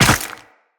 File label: land ice, footsteps; Tenno